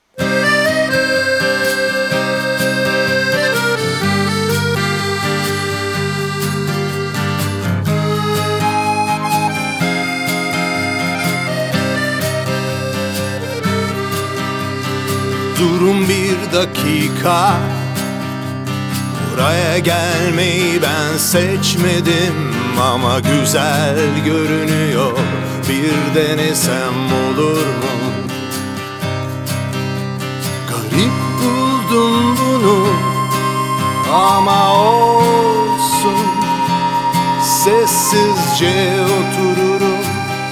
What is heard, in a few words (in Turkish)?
Cocuk